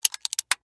Steampunk Device, Scifi, Steampunk, Device, Clicks, Small; BlastWave